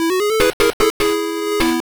Game Defeat; Kamen Rider Agito & Kuuga Wild Battle; pico gamerip 2001

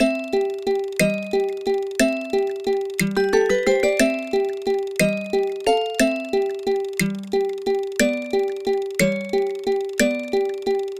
Photo Timer, Underwater; Photos with series; Super Mario Bros

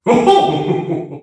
Caterpillar tickle

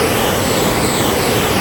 Gameplay Item Jetpack Loop; Zombie Catchers

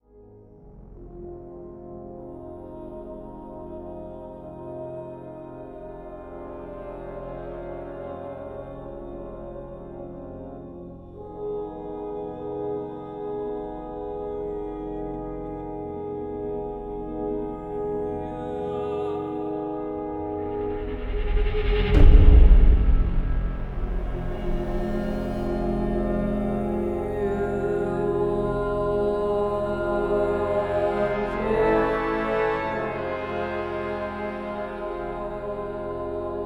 Boss Intro Music; Vault

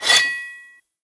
Rank Up Badge Change

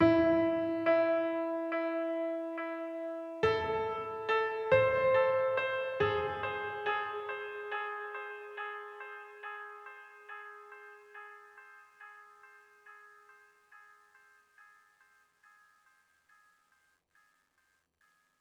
One shot Piano; zombie army 4 dead war; ps4 stadia switch windows xbox one

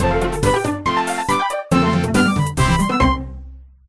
Farming level up

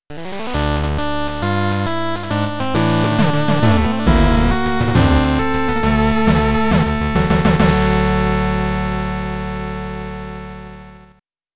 Victory (Chiptune)

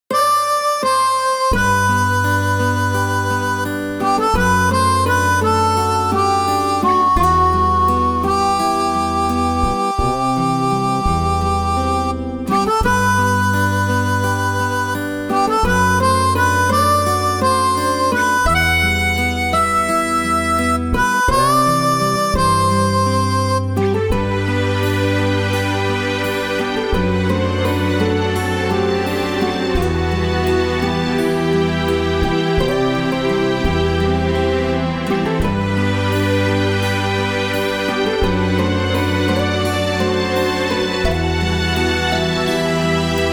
Nostalgia loop; otome the exorcist

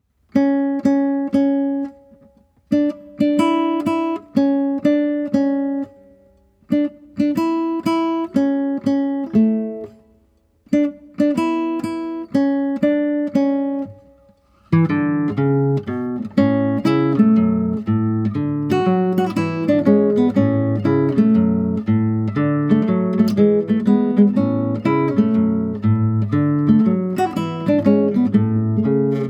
Title Background Music, guitar; Dr. Mario; super mario series for guitar 2010

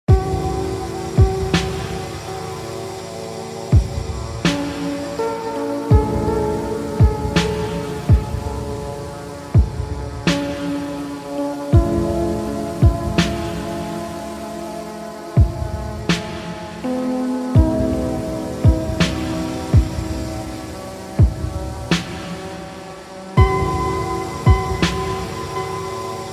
Falling Asleep To The Sound Of Rain Vaporwave; Retrowave

